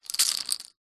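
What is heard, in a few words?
leisure poker chips handful set down hard surface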